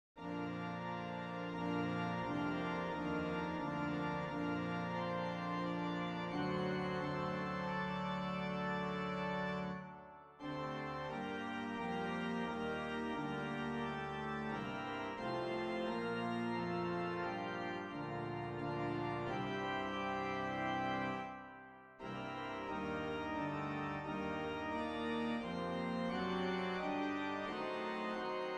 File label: Organ